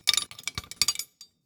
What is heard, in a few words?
metal handling